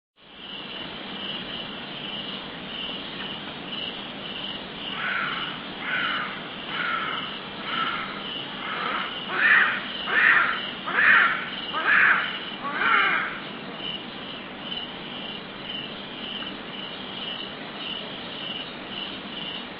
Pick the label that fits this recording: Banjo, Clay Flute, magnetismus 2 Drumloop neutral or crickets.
crickets